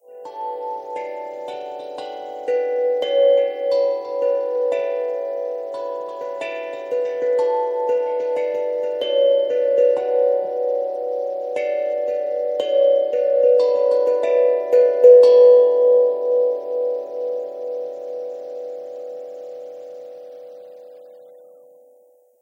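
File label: Rhythmical Hangdrum; Mudita Pure; bell alarm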